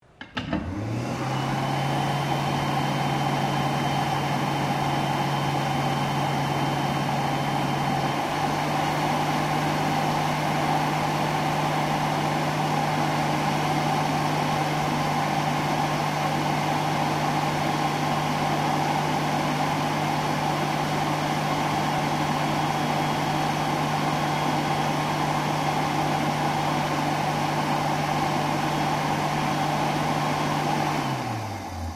DRYER COMMERCIAL HAND DRYER OPERATING, Hand blow dryer, Public Bathroom; Offices